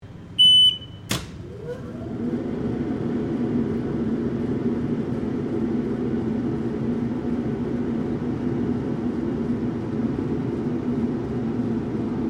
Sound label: OVEN INDUSTRIAL BAKERY OPERATING STEREO, Indsutrial Baker Oven; Appliance Sound Effects; Appliances (Sound Effects:Household)